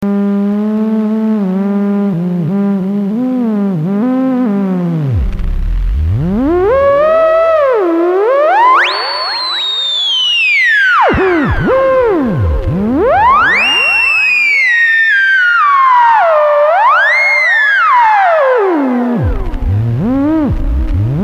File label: theremin